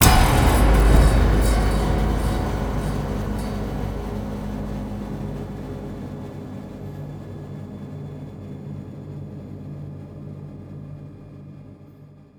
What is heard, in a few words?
horror fanatic long; Cinematic Metal Impacts